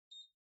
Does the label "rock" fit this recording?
No